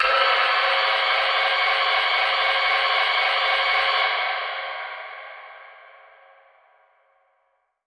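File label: Reverb Proc, alarm siren samples, 120bpm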